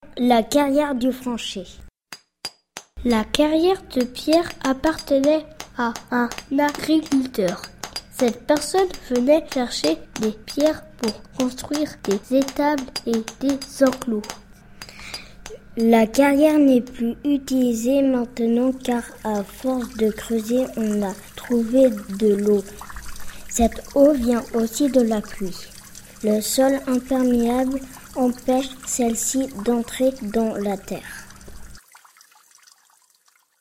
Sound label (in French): ancienne carriere de franchet